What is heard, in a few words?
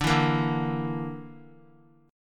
D Suspended 2nd Sharp 5th D; Dsus2#5; strummed; JGuitar